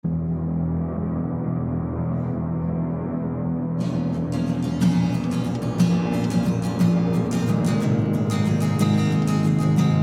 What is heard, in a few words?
acoustic, drone